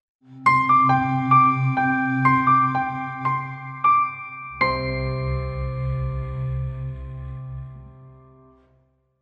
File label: Elegant Piano Logo